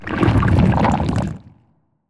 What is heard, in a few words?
Flam Eater Lava